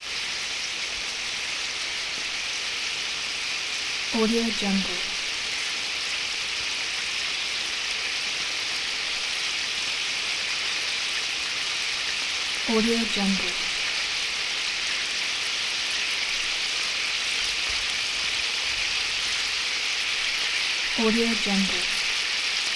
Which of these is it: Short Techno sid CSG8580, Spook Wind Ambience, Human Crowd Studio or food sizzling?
food sizzling